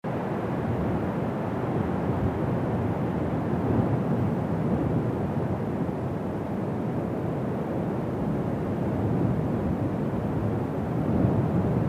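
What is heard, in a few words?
Wind Breeze High Altitude Muffled Loop; the unfinished swan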